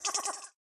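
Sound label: leprechaun wound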